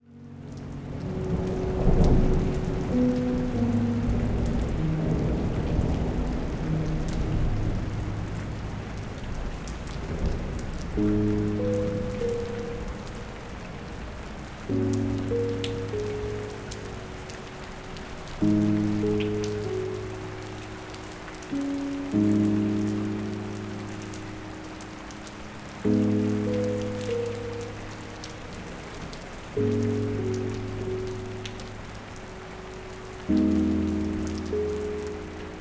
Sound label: Rain